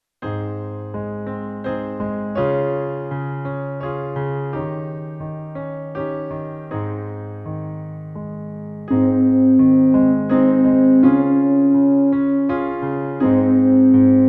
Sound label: Swaying in Bb, brass